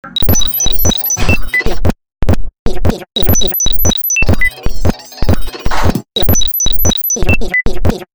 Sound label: Plastic